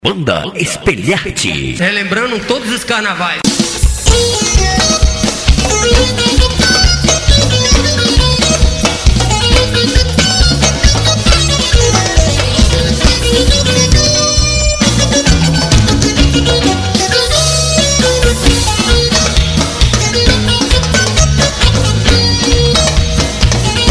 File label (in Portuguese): instrumental